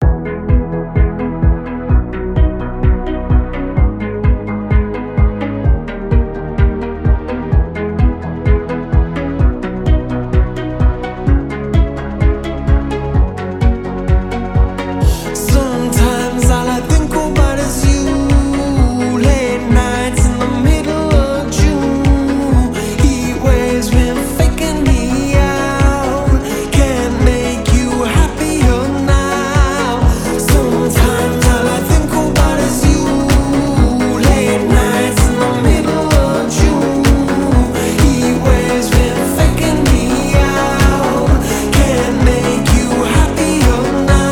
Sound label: Remix